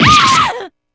damage; naoto; p4u

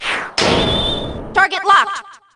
target locked; aigis; p4u

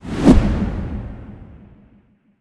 upper

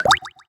drip

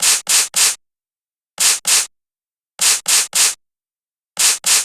sweat; lbelle

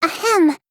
"ahem"; monaca

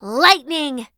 lightning!; tressa

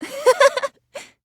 laugh; kaede

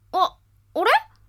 huh

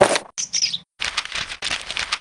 book open

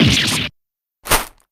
damage

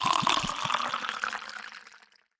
pour

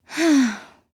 sigh; celestia

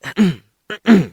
ahem

